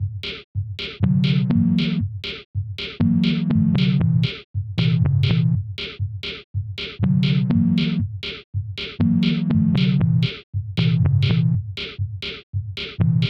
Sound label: sinewave project